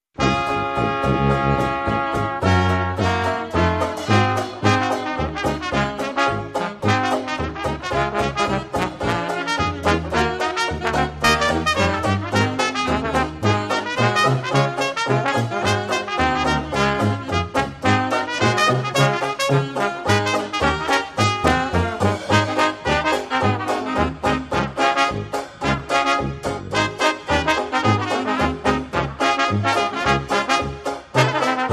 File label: Shuffle, jazz band